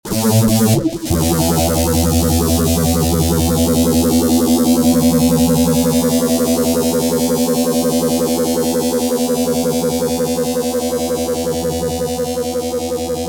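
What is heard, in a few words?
fuzz guitar master phaser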